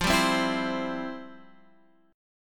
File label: F Major 7th Suspended 2nd, Guitar, Half Step Down; FM7sus2